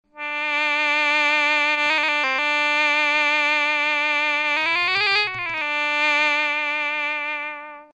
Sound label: oboe